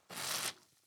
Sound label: bubble over; gastronomie; berufe